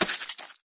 flesh, footsteps survivor walk; Left 4 Dead 2

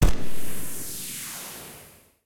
shoot; Alawar; Crusaders Of Space 2

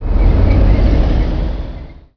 flame tank move; Emperor - Battle for Dune

